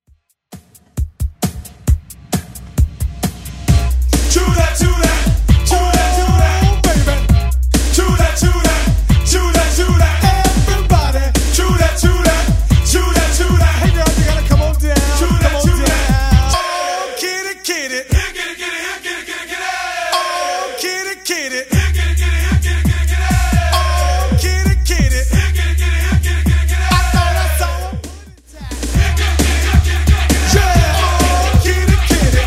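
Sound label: 90s Old School DJ Intro Outro Redrum 133 bpm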